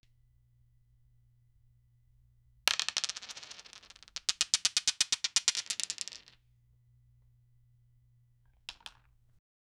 dice rolling sound; household

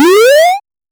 a video game chiptune jum

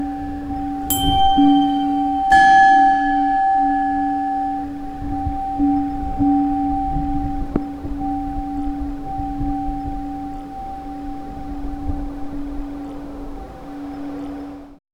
the noise of the kremlin, chimes

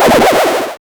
fun 16bit springy jumping

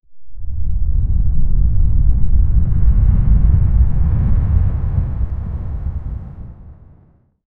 generate a 5 second inten, lava

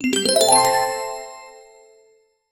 magical level up, bell

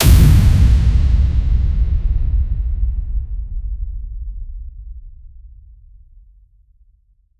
a violent explosive burst, air

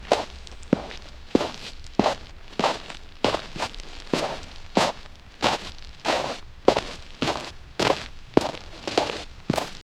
steady marching boots of, desert

